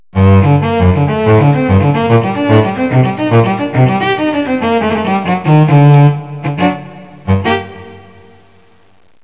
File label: CELLO